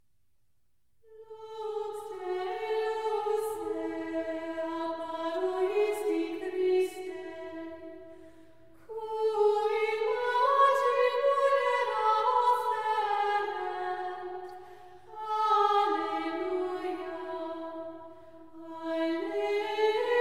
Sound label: Antiphon; Lux de luce